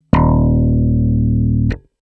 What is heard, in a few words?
e-bass 39Hz